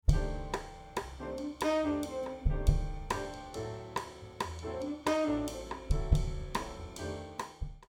Toms Meld Inv

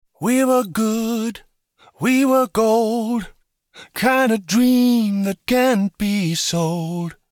Whisper In

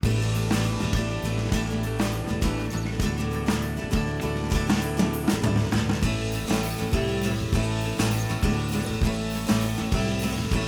Bass No Double

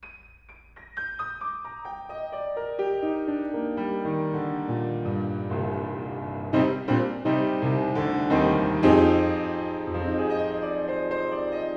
Piano, Distance 285cm Mic

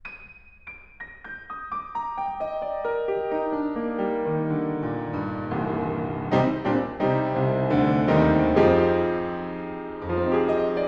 Piano, Horiz Off Mic

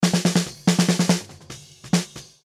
Snare Mix With Flip; Multimic Facets

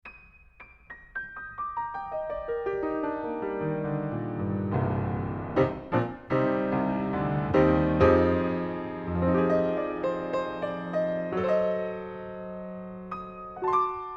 Piano, Close Outside Pair

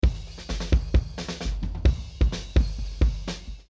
Multimic Facets Kick Mix No Flip

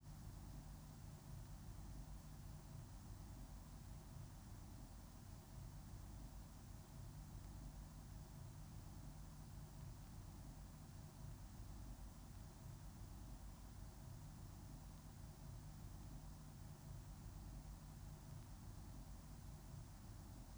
Mix Noise Solo

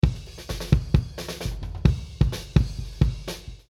Multimic Facets Kick Mix With Flip

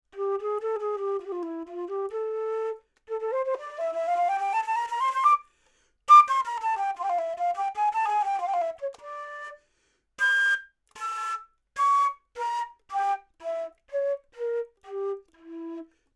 Flute, 40cm Mid

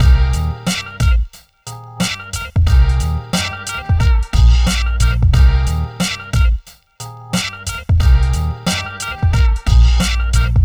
Drum Loops Comb Mix; Mixing Secrets

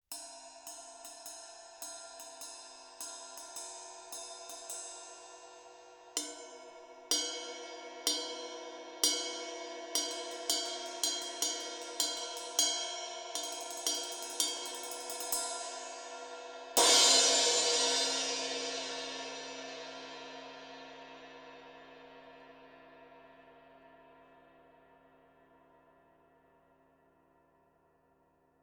Cymb Ride; 30 Degrees From Vertical

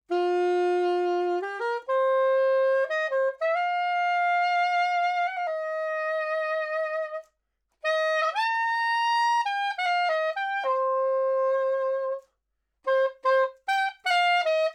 Sax Soprano 50cm L Mid

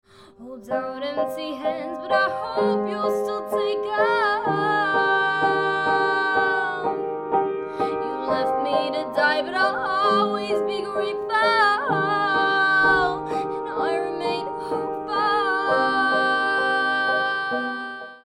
Singer Piano Piano Dry

